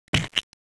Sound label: splat